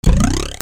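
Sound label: percussion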